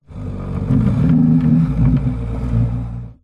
household coffee table wood maple heavy legs slide wood floor slow